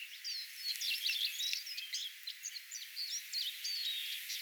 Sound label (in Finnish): onko tuo ehka leppalinnun idanpikkusieppomainen sae